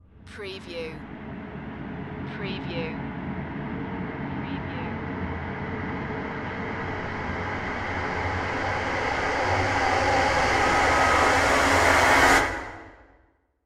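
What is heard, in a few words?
Production Element Reverse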